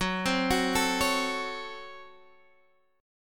C sharp Major 7th Suspended 4th Sharp 5th F sharp

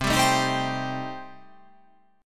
C Minor 6th C

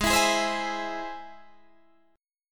G sharp Augmented G sharp Guitar Open D

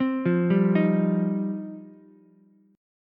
F 7th Suspended 2nd F Ukulele; arpeggiated; chord; F7sus2; JGuitar

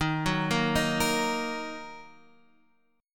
Gb 6th Eb

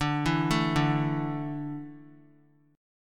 D Suspended 2nd D down; arpeggiated

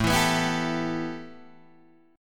A 7th Suspended 2nd A Guitar Open D, chord

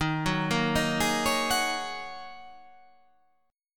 D sharp Minor 11th D sharp, chord

